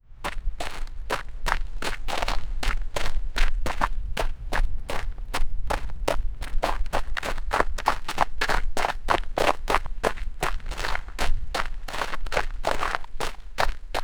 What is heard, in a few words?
man running on a pebble, coastal